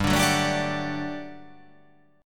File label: G Major 7th Suspended 2nd G down